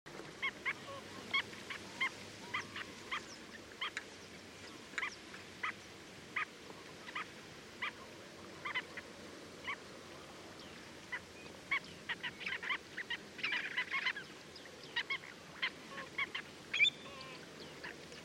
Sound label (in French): Barge a queue noire Limosa limosa islandica